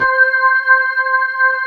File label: B3 HAM, Keyboards Old School